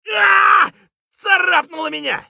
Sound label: scav wound; eft